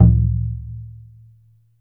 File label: MALAY DRUMS; Spectrasonics - Heart of Asia CD2